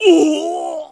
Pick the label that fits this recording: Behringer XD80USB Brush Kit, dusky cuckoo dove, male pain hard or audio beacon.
male pain hard